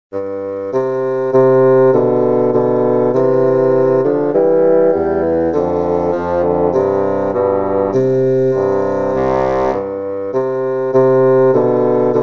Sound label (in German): Duo Barock